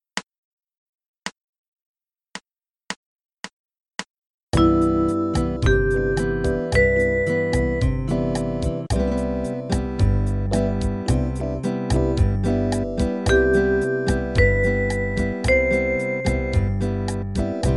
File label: Maj7 First Inv, Ear Training arpegg major